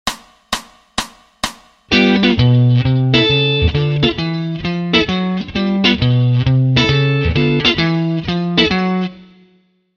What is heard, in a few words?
Walk Bass Lines; Bassline Pattern: 1-3-4-#4-5-6-b7-7-1, etc.; FAST